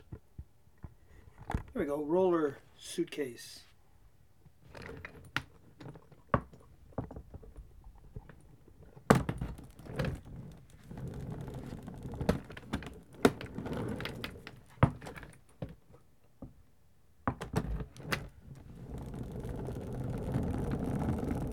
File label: Roll Aboard Suitcase Rolling On Hard Surface